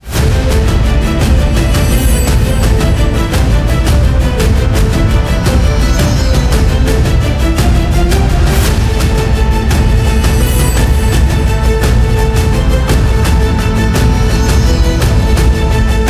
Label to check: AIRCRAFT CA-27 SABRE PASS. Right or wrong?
Wrong